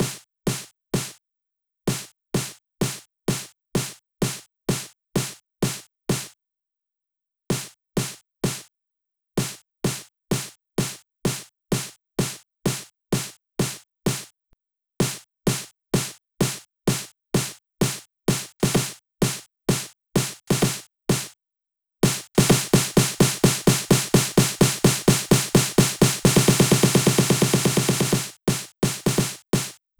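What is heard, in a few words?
Drum Loop snare Uplifting, 128BPM; VEC5; Multi Loops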